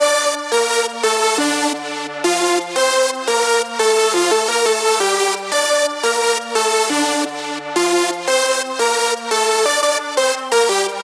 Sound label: Synth Lead Loop 174bpm Gmin; Hyper